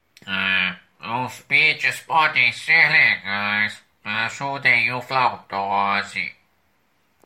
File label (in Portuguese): bits flautose; Lula Molusco